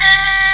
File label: HEAGUM D#3, KOREAN; AKAI S6000 CD-ROM - Volume 1